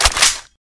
smg clip out; weapons; deluge

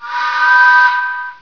train; kart race

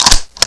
bolt forward; svencoop; ww2projekt

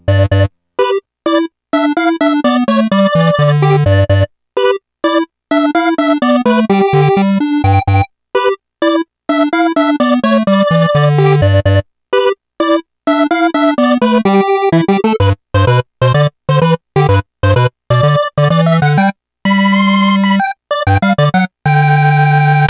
elevator action; de elevatoraction

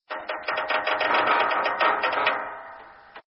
Metal Stress; docks

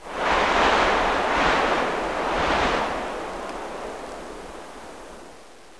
sand; de commence

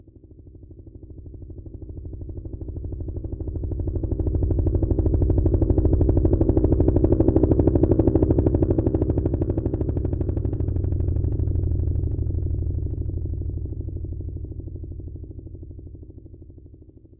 chopper; de alphacode wi

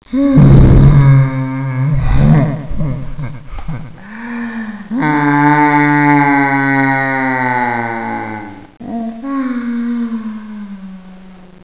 groan

